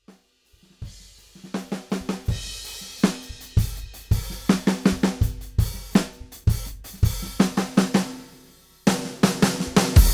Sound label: Groove, drum